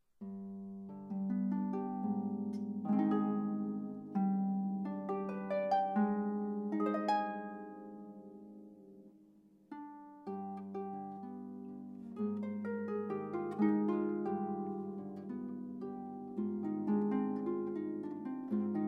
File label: Spanish Serenade, solo harp